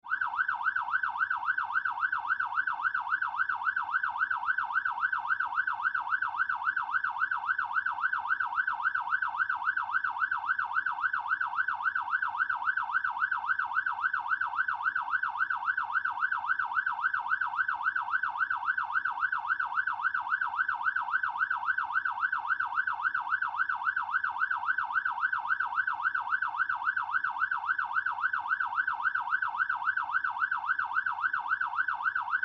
Emergency Fire Truck Siren Interior Cab Perspective; Emergency Vehicle